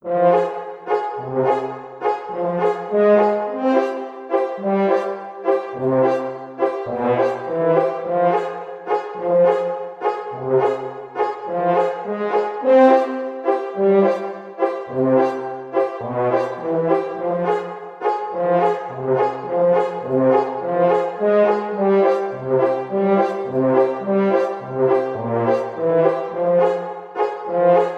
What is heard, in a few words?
Sad Tuba Ballad; don t be afraid the first toy